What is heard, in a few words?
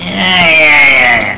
JAJAJAJA